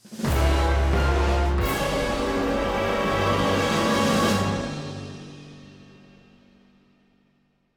Lose; Capcom Fighting Collection 2; PS4, Switch, Windows, Xbox One

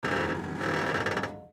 Alchemist Creak

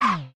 spiderboss move

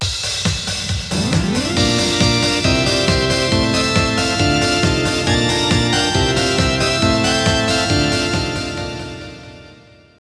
Str G#

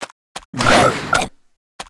jump; E Croaker

